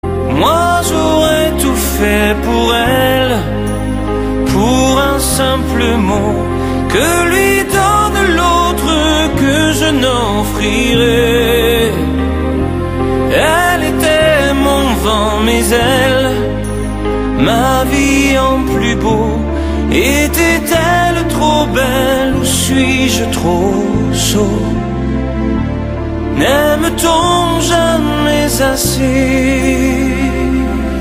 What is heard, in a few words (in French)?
4 mots sur un piano